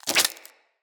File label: Root Spider Foley Leg Swipe; Animals